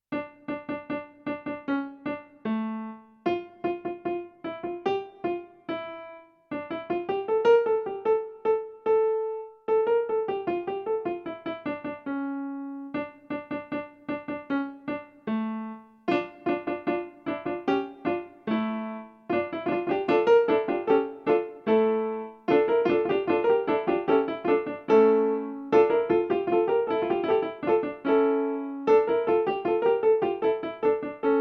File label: canon piano